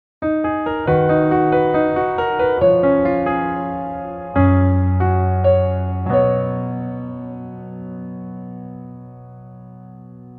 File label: Piano ver